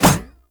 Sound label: Baby Step; Warframe; Nokko Colony; Visions